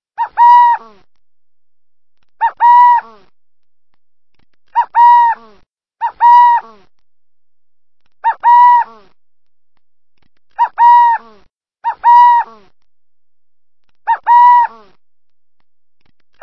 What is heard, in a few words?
Western Burrowing Owl; Troy Wellicome